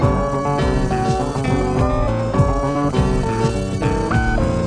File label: Robot